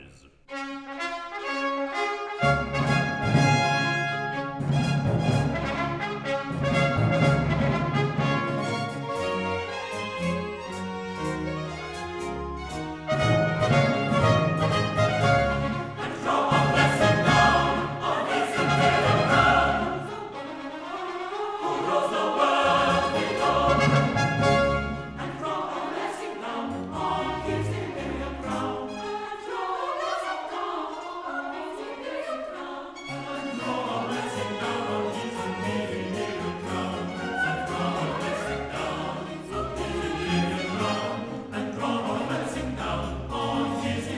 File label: Chorus And draw a Blessing down; Act 1; Handel; Theodora, HWV 68